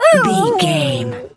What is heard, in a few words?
dog hurt whimper howl long